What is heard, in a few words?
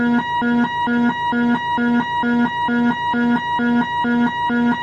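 boeing 757 overspeed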